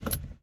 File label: driver foley wheel turn; Blind Drive